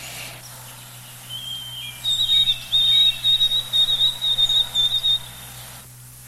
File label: sparrow WT